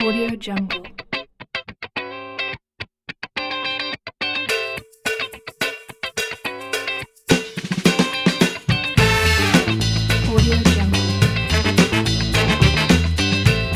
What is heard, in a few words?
back to 70s